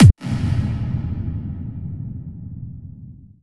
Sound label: FX Hall Kick, 140 BPM; Vengeance Samples; Vocal-Hook Kits; Song Kit